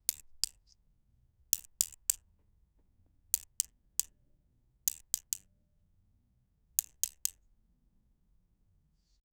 pen placed on desk small

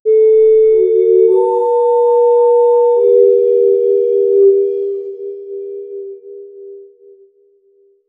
healing sfx for a game

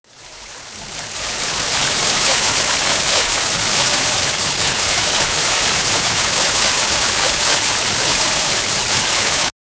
running sound effect; surface

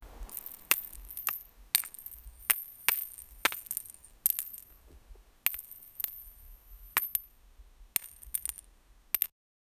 stones, rocks

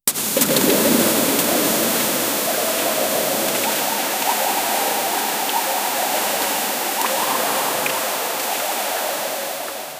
soft waterfall with echo

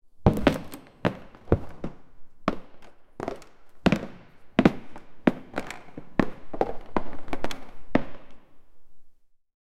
several sneakers fly out; multiple

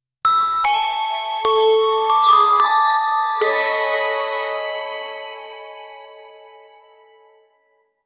soft mystical cartoon ton, twinkle

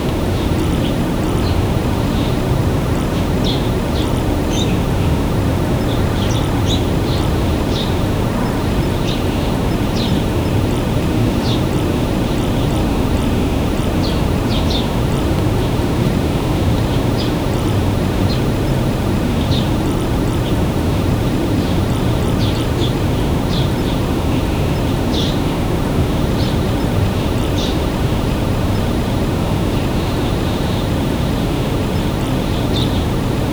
inside an old swedish cot, cottage

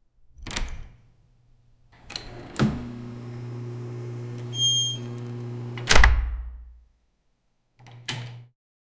opening door sound, scary

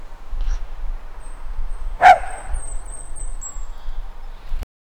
dog barking outdoors in a, leaves rustling

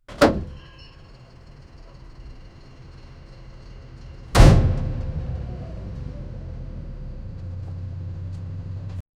a sudden sharp bus window, slam

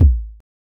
a drum sound for, snare

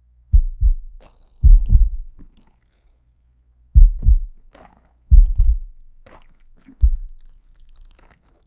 feet sound effects, grass

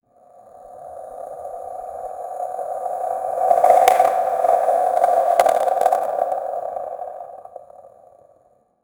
a realistic sound effect, surface

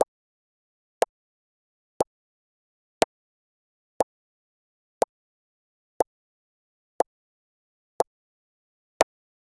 short ui button click fantasy

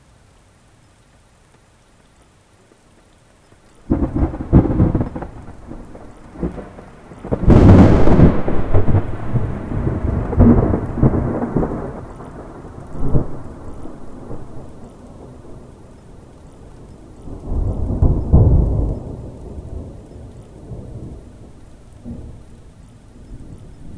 thunderstorm with heavy r

